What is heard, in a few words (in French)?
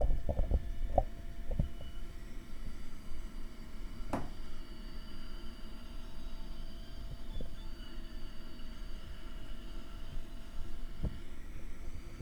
le conduit ventilé, appartement